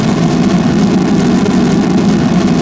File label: afterburn; Earth and Beyond; EA Games